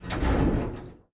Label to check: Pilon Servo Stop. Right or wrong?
Right